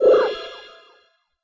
Gate Open; The Denpa Men; Android

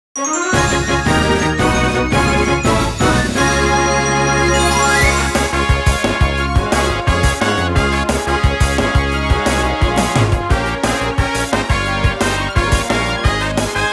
Battle Victory; Summon Night X Tears Crown

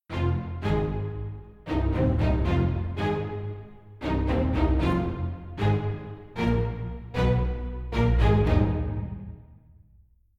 String; The Bureau XCOM Declassified

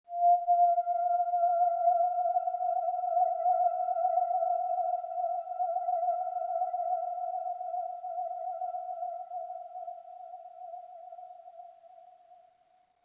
Oneshot Synth; zombie army 4 dead war; ps4 stadia switch windows xbox one

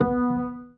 piano - octava0; You Are Empty

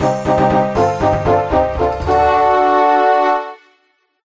Defence level up

